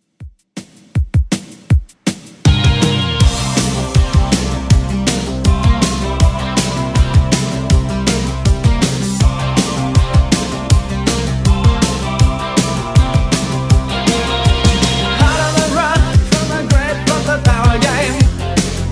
80s Redrum 160bpm

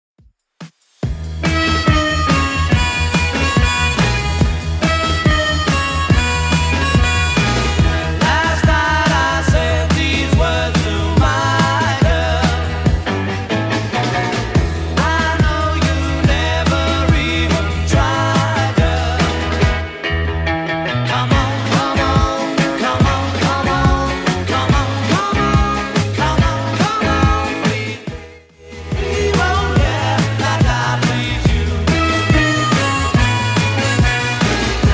60s Redrum 142bpm

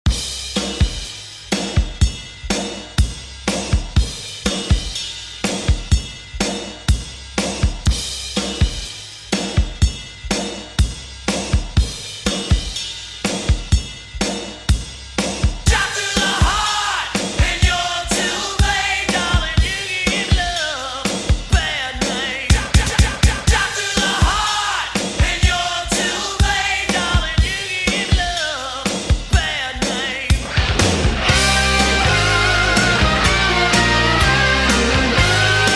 Dj Intro Outro 123Bpm

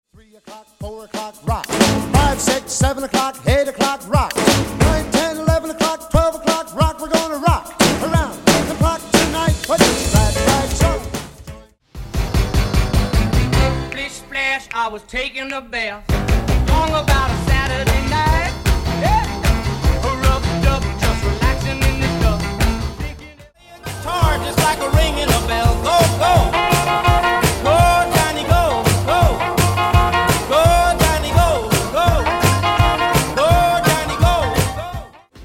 50s Redrum

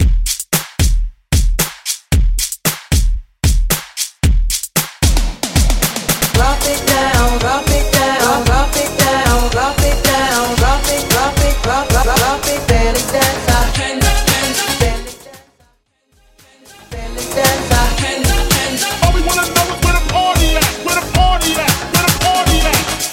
80s Classic Party Break Into Dance; Re Drum In Transition Bounce 113 128

